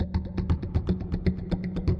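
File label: 120 bpm bass percussion loop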